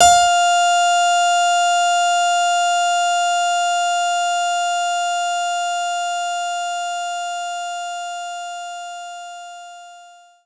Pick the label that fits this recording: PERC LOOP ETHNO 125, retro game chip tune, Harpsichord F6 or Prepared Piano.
Harpsichord F6